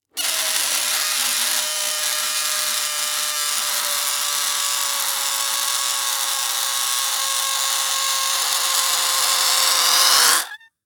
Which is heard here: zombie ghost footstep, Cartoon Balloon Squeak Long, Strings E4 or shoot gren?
Cartoon Balloon Squeak Long